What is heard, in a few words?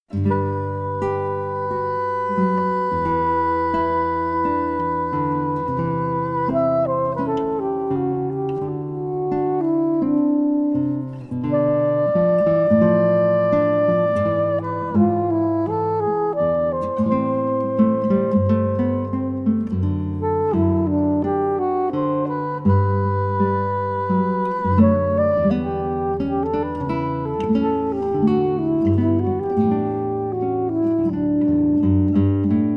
air on a g string